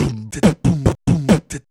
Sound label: Beatbox Loops, Human Beatbox